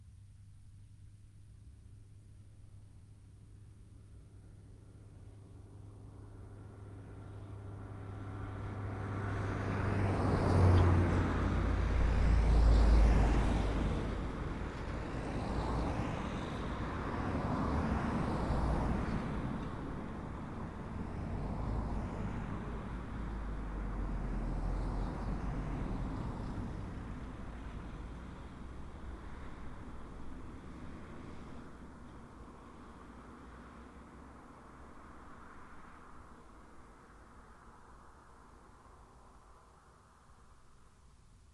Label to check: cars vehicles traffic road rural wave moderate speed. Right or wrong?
Right